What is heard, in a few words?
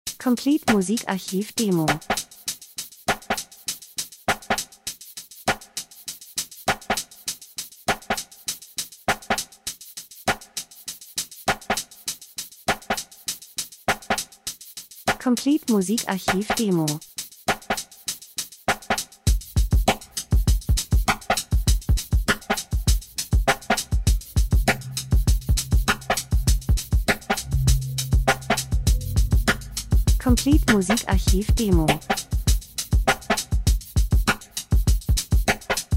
drums; Schlagzeug Percussion Getragen Cool Angenehm Szenen - Übergang Feste Feiern Groovy Lifestyle Dokumentation